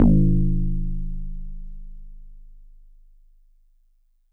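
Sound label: Metal Tube DX100 G sharp 1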